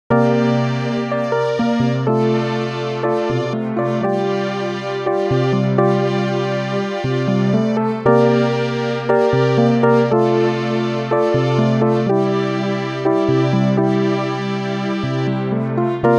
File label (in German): Arturia SQ80 V manuelles Layer